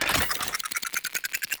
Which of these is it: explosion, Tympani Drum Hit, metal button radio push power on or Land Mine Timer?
Land Mine Timer